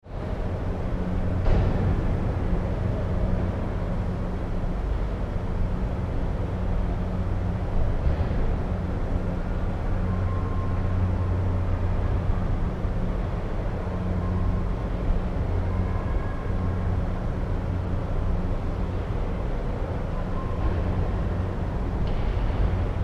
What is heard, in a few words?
Underground Car Park Atmosphere - Background fans and traffic; odd distant voices; Garage; Tom Hutchings